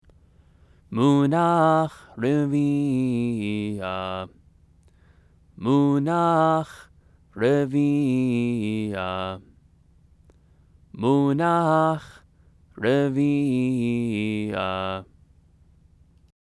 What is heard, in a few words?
megillah; trope